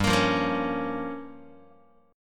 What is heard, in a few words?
G Minor 7th Flat 5th, Guitar; Gm7b5 strummed; JGuitar